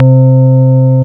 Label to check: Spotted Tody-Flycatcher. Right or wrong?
Wrong